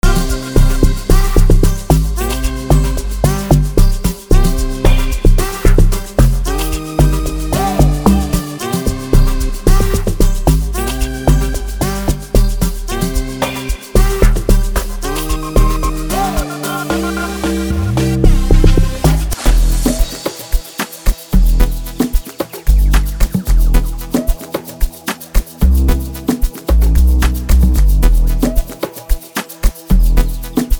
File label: PIANO TRAIN; amapiano; wooloops; tru hitz